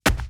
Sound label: Hit